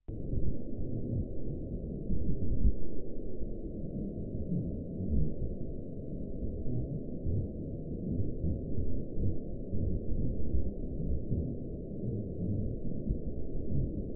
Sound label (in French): murmure algorithmique dis